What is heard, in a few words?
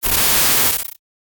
hack failed a short, buzz